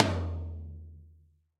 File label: Tom Low Reso Classic; Drum Hits; Ableton; Factory Packs; Session Drums Club; MPE Hits